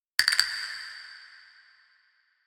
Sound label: castanet